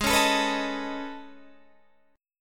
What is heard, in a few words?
A Major Sharp 11th, Ab